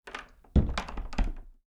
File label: door close